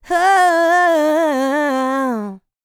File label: LICKS, Vocal Planet R&B Dance; Spectrasonics; LT R+B